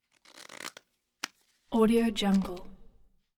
deck of cards; prosoundfx

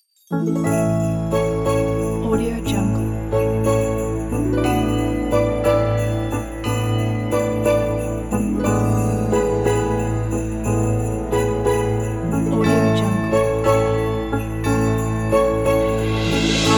festive majestic christmas carol; animapiano

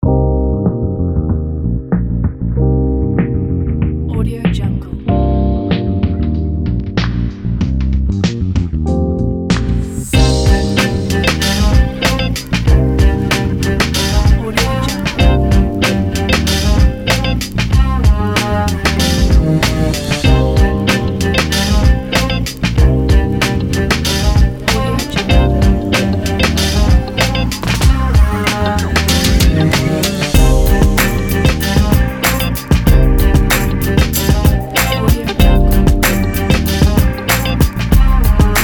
hip hop

